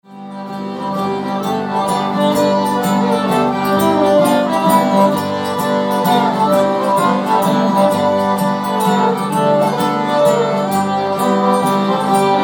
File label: cajun show